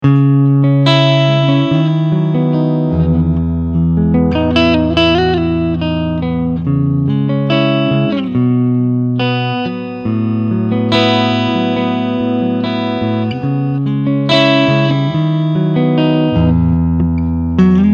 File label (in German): Clean Picking Frontpickup